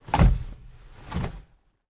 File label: foley hit; driver; Blind Drive